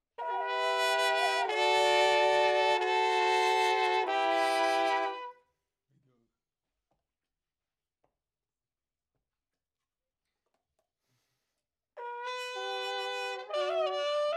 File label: Trumpet Room